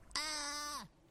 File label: pew makes a noise